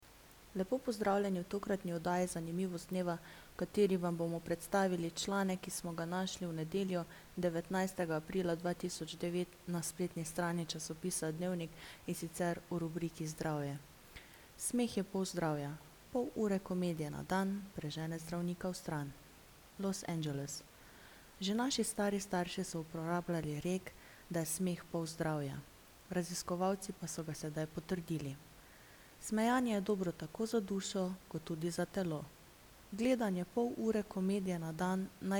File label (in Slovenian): smeh; pol zdravja